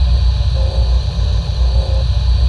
amb steam; cruisecontrol

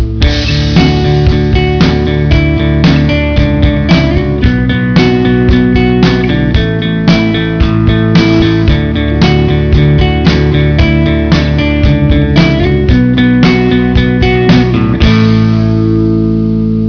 da loop

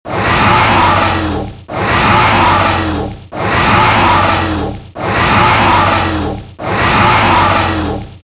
main door

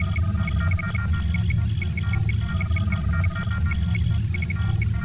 machines; poseidon

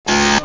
denied; cruisecontrol

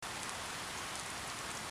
patter; stormsiege

